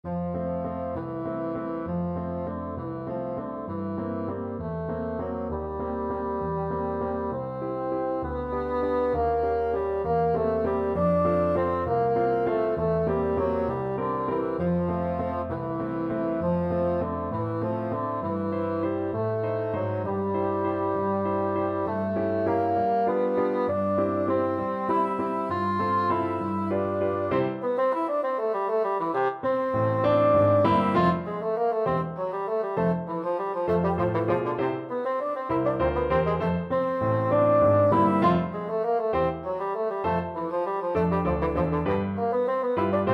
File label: tchaikovsky waltz, bassoon